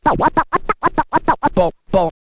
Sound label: scratch kit, vinyl